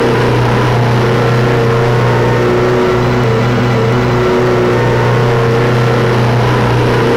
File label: i4 petrol, engines; i4 petrol16 1; ACF CustomMod